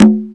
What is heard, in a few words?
CONGA, PERC; SoundFactory